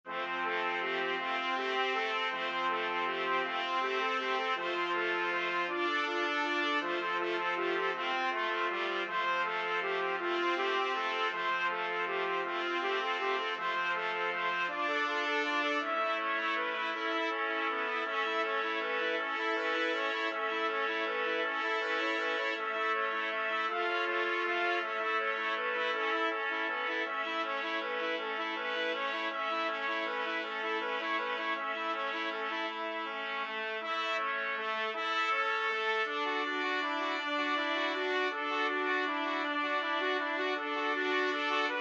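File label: 4 trumpets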